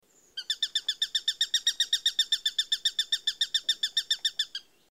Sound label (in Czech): Krahujec obecný Accipiter nisus